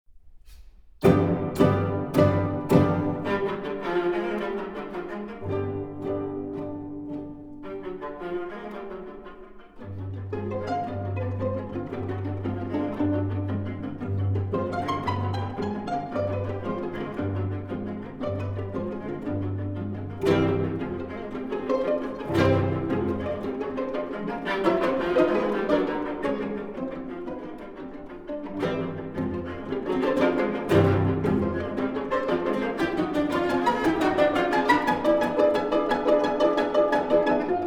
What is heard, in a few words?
string quartet; debussy; op 10